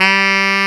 SAX TENOR F, SAX Tenor ff

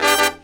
E DBL HIT, Hip Hop; E-MU Formula 4000 Series Vol. 1; Hip Hop Nation

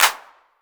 808 CLP; Wizoo; Platinum 24 Electronic; PERC TR

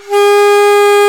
World Winds, Old World Instruments; E-MU Producer Series Vol. 7 – Old World Instruments; World Combos

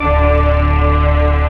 SYN TV, dance synth; Sound & Vision - Gigapack I CD 2 (Roland)

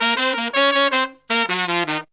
160 bpm, horns

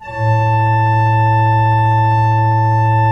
A1, Cathedral Organ; ILIO; Propeller Islands Cathedral Organ

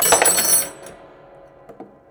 Prepared Piano; Battery 3 Sample Kits

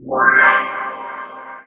ORCHFX, orchestra, Asian Traditions; USB Soundscan vol.60; AKAI

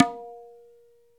TABLA TIN; ILIO; Synclavier Percussion 2; World & Orchestral

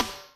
Sambie, Electric Snare, Yamaha DTXpress

